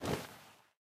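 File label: movement armor; smite; pele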